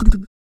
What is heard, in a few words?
Vocal, Blues & Soul; ILIO; Vocal Planet VOL-2